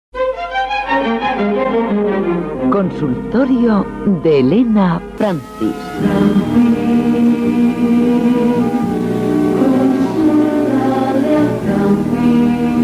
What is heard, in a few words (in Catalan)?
SINTONIA cantada